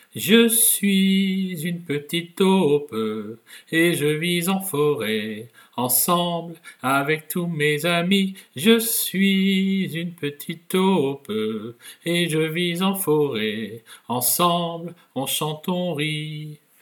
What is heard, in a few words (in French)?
chanson